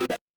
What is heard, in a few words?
retro game text blip